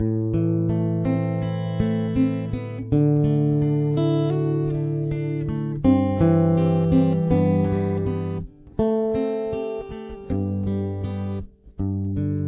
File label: theme, guitar